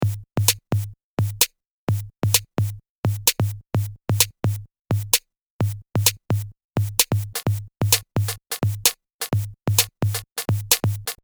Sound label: small beat; Loop Mania Sampler Pack